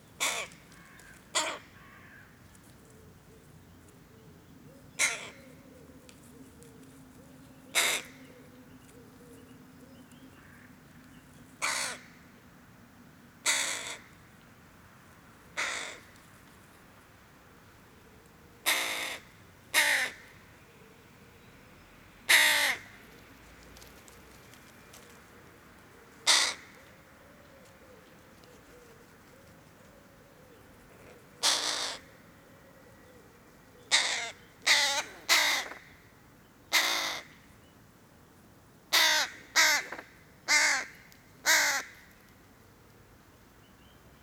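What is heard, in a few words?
hooded crow corvus cornix cornix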